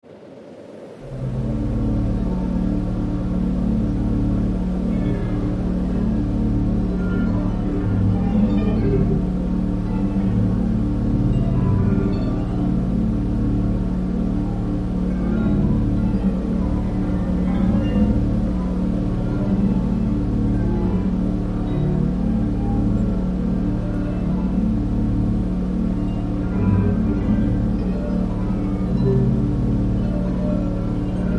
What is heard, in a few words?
Chimes Subtle Elect Hum